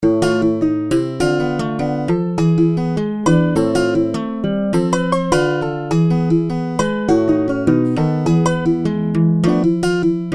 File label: plastic guitar